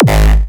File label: G#, Kicks; Frozen Dream